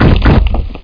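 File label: stone pain, golem; Hexen II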